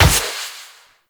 Reaper Wall Run Step; Wraith

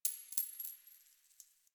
Coin Drop

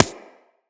Laser Impact Generic

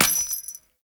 Step Default, Footsteps; Warframe; Grineer; Royal Jester; Foley

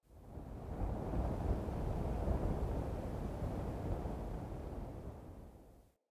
Fort Wind Gust Low Mid Howl; Ambience

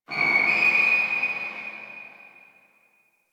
Distant Shoe Squeak H; Warframe; Ambience; NineteenNinetyNine

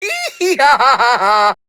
Laugh; Grineer Queens Guards; Battle Barks; Warframe

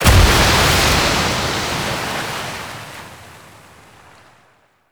Pirate Kraken Cast Impact; Warframe

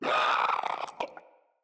Infested Runner Hear Sound

